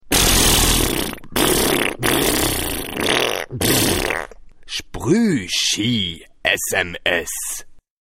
Ugly Sms

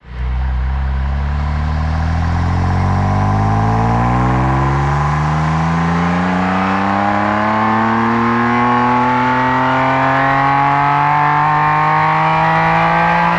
BMW M3

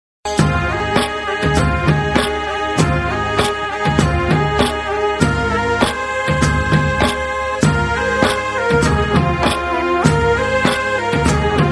Beats

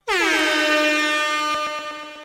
Air Horn

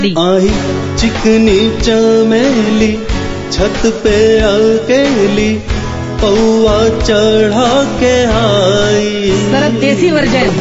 Jazz

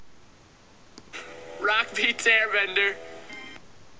Rock Beats

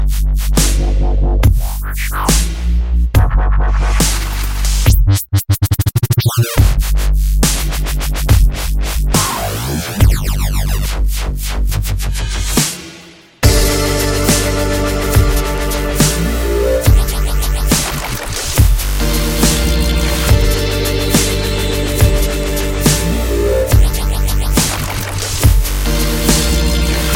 Dubstep Beat

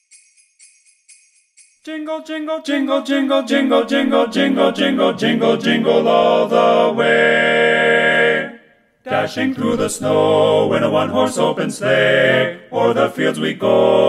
Jingle Bells

